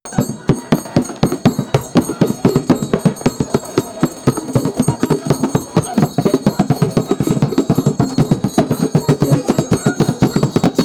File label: loud festive drums dhak, festival